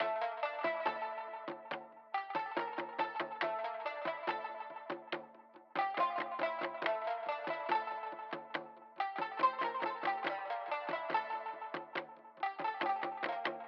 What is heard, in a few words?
elevator music; B Path of the Teal Lotus